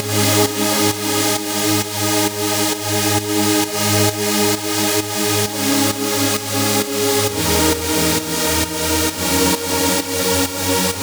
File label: 132BPM Full Pad DRY, G# major; Vengeance Samples; Jericho Kit